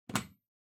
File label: Power Strip Floor Gentle Unplug